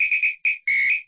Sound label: Hip Hop Whistle